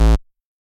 bass

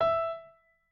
piano

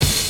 breakbeat

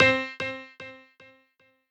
piano sabi

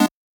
Chord

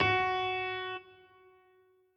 piano riff intro

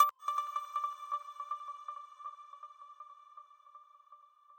arp

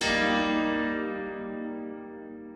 piano

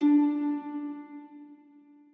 harp

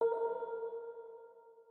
organ

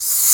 Hat; 千恋万花

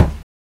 kick

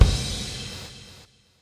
Crash extended; BanG Dream

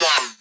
bass3

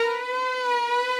violin

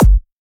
Dr Kick

